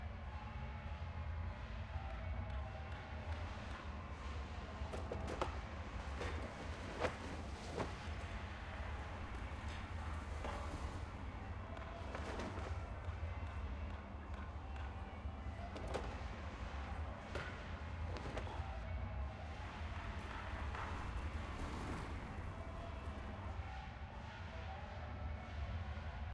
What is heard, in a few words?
amb market; tft lny2022